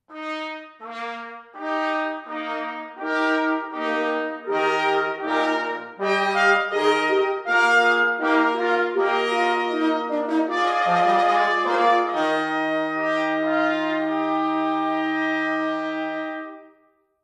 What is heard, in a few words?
Fanfare, brass; Fanfare #2